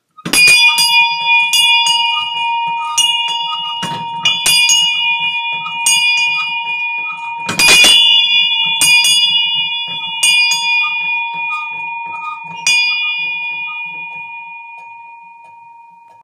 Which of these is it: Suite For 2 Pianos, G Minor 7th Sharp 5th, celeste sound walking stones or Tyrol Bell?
Tyrol Bell